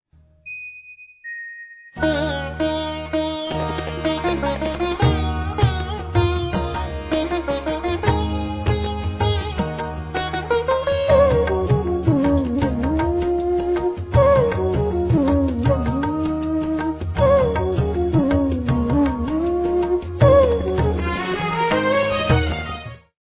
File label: Instrumental